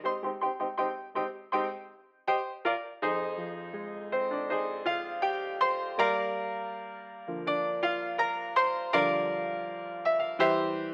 old piano, Smooth RnB; 081 Cmin

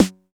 SNR, TR909 Snrs; Cheez Snrs

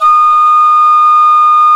ALT D#4, MOECK; SoundBytes - Early Patches

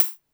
polyvox hat; Formanta Radio Plant

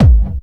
KICK hardcore, Techno Trance DRUMS; Techno Trance Essentials

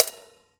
Prepared Drums

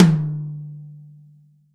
TOM, Real Drum Kit, FUNK KIT

